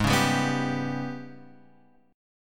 G sharp Minor Major 7th Double Flat 5th, Guitar Open F; strummed; JGuitar